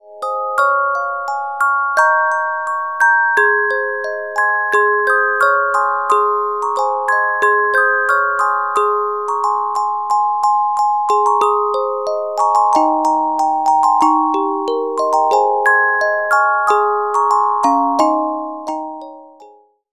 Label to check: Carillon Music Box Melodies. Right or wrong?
Right